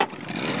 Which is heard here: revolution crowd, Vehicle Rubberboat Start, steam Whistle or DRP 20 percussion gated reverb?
Vehicle Rubberboat Start